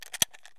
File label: mosin round load; Escape from Tarkov